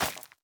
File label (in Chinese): 收获下界疣声